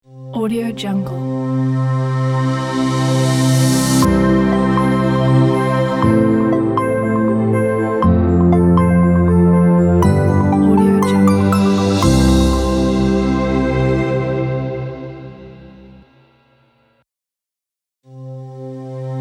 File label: ambient logo; bintang terang